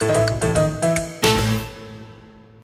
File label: intro Free Spin; jackhammer